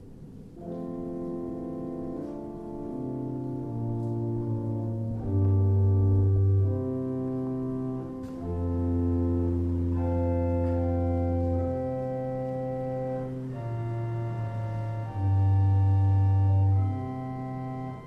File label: Osmond; Klangprobe Dulciana 8' - M1